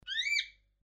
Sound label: cockatiel